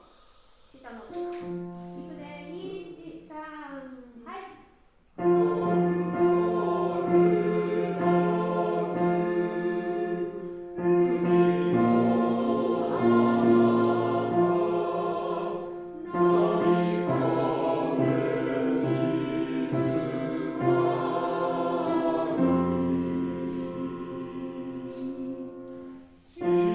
low

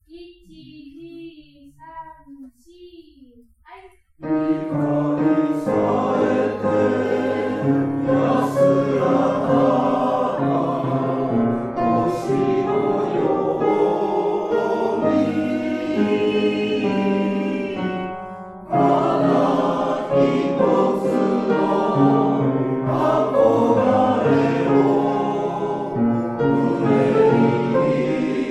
akogare chorus

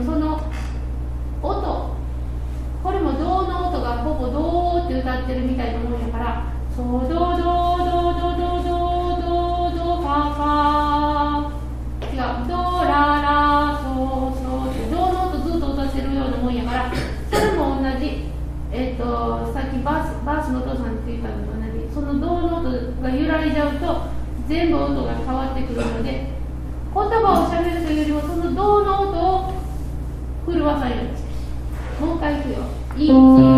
akatonbo baritone

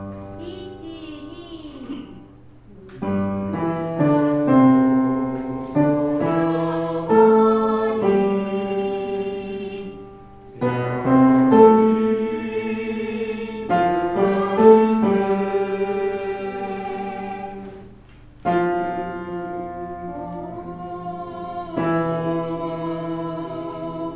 furusatonoyamanimukahite baritone

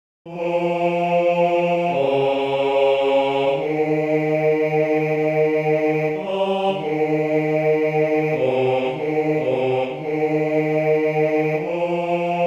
baritone

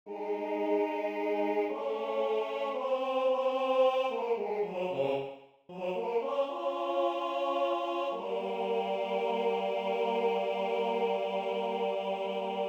high

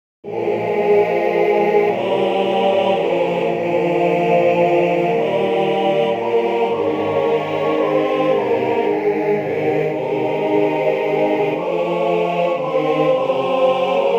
chorus

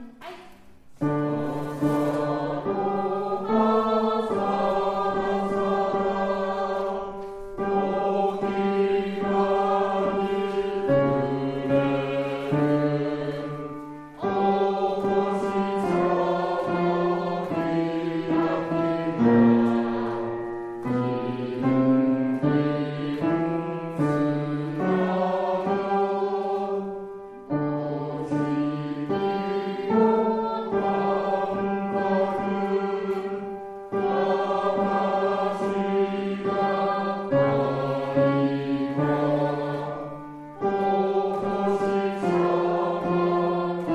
low

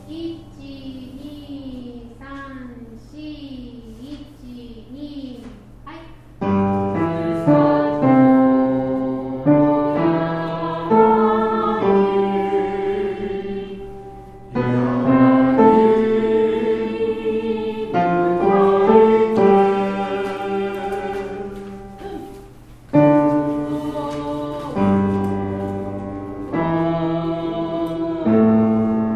furusatonoyamanimukahite bass

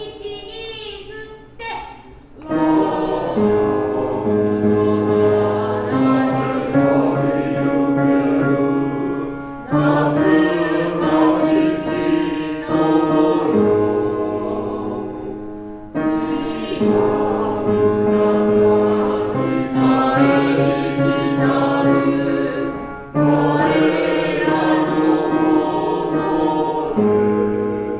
bass

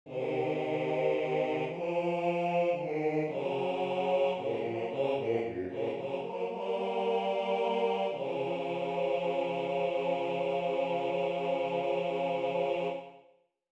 low

